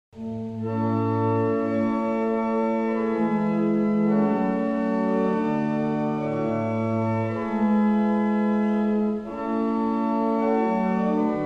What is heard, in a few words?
Cambridge New, barrel organs; Avington